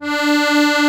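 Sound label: accordion D 2; Best Service; Ethnic Journey Sound Cube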